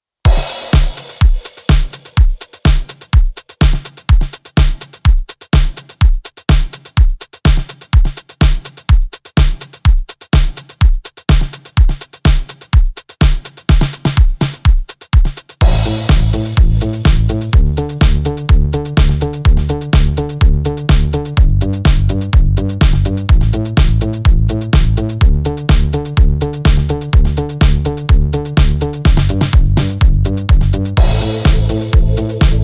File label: Instrumental